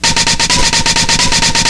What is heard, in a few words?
clickity; Pringles